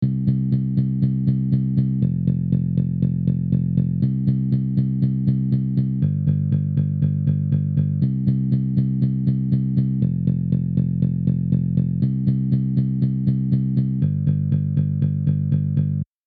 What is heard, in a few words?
bass Guiter